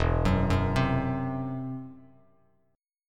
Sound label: E Suspended 2nd Sharp 5th E down Bass Standard; Esus2#5 arpeggiated